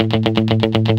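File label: GUI 120, guitar; Best Service Gigapack 2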